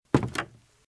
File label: Footsteps - Wood - Creaky - Walk - Left; RadwinIsland